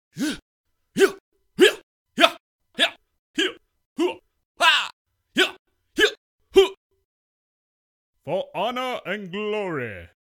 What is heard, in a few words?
battlecries